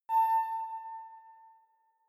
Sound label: sci-fi facility ai awake; soundpool